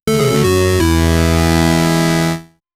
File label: retro 32 bit video game, lo-fi